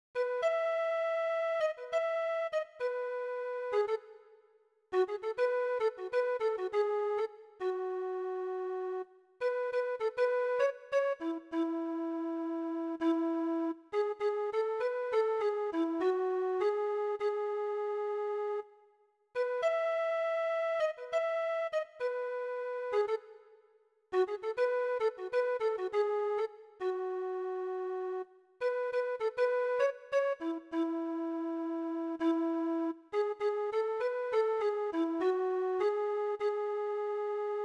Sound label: flutes